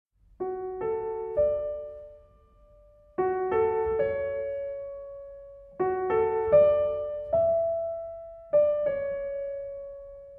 Piano Suite

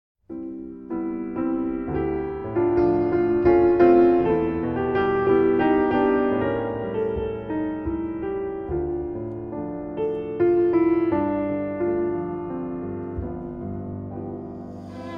2 Violins and Piano; Botticelli Trio; The Old Refrain

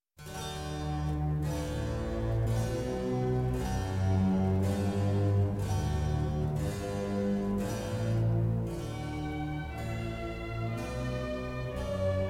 Canon And Gigue In D Major, Arr. For Orchestra; Canon And Gigue In D Major, P 37 - Arr. For Orchestra By Max Seiffert; Pachelbel Canon And Gigue

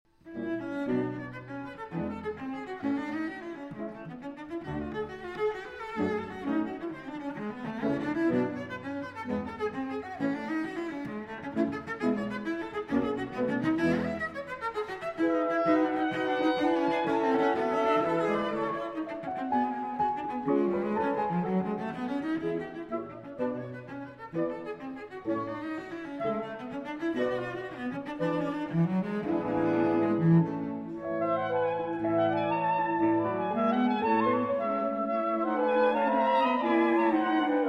Cello, Piano & 4 Sax; Tchaikovsky Variations on a Rococo Theme, Op. 33 (Arr. Malzew for Cello, Piano & 4 Saxophones)

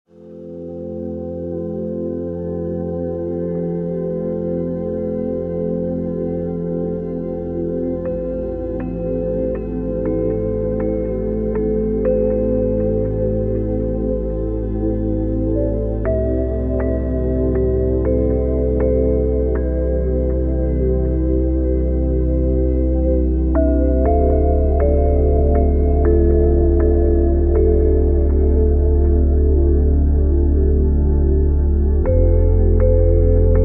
Binaural Theta

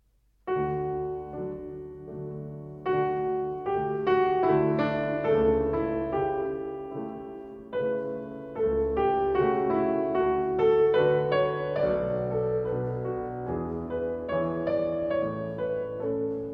Violin Sonatas and Partitas, Sonata in A minor; BWV 1003 III. Andante

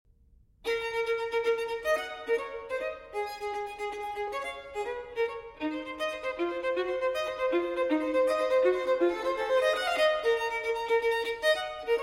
Solo Violin in B Minor Allegro; TWV 4022; Telemann Fantasia

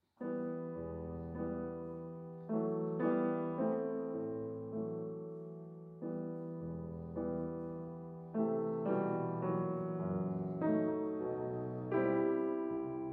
Cello Sonata in E Minor, Adagio Sostenuto; Op. 35 III.

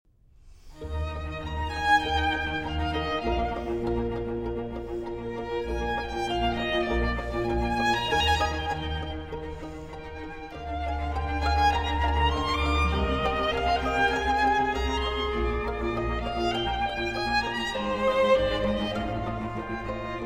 Violin Concerto in F Minor

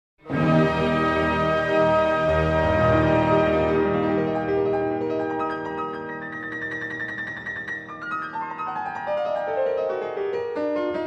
Piano Concerto in E-Flat Major, Allegro; Beethoven; Piano Concerto No. 5; Op. 73 Emperor I.

